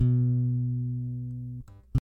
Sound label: string6 b1